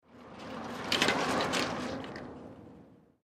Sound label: Bicycle Mountain Bik